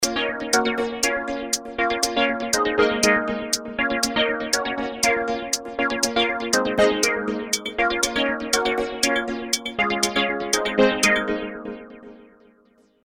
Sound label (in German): Kodamo Mask Filtered extern